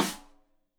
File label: Rim Shot Stereo; Deeply Sampled Drums; SMDrum